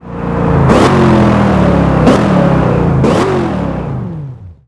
throttle off, vehicles; camarozl1

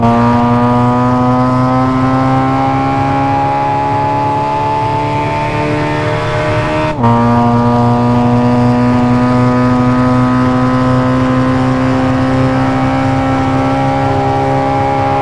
fourth cruise, vehicles; countach

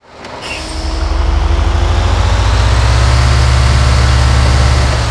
first, vehicles; gmod; tdmcars; gtav; bus

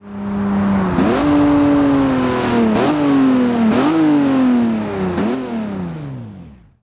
throttle off, vehicles; bmwm3gtr

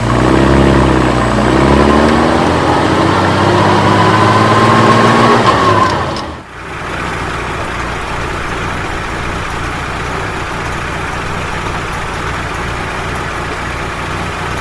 throttle 1st, vehicles

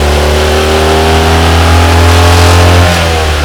second, vehicles

